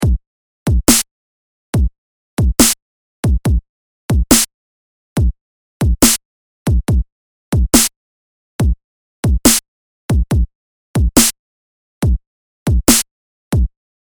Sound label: Beat